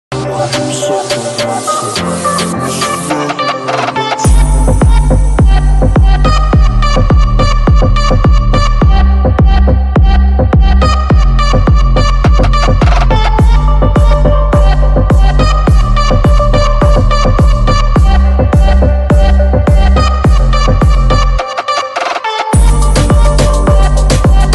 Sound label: Mobile Ringtone